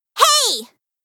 battlecry; hat kid; a hat in time

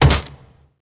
pig attack hit; A Machine for Pigs

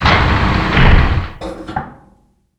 main door close; tf; 2fort tfc; doors

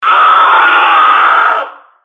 scream

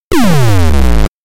squash; tf2ware; frogger

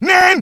jump; freak fortress 2; meemswarm; demo

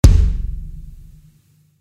kick, drums

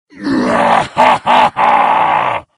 moarg alert

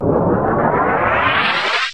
basic bomb; tf; danmaku fortress; reimu

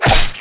claw hit; xenomorphs

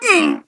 pain; genji

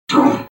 jump; freak fortress 2; grievous

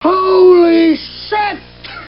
animal; hl2dm

